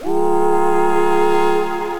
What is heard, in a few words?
synth pad; Vending Machine Dreams; Ableton; Glitch and Wash